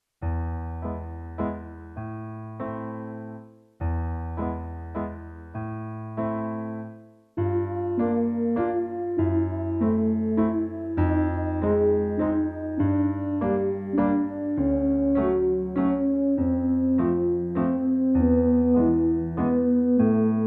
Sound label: Level A Warm up in Bb, brass